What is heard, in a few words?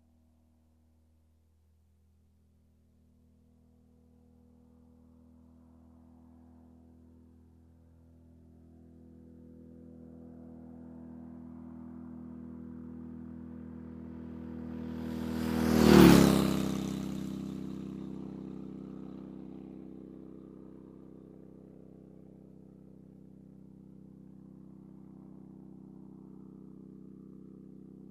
Motorcycle passes by quickly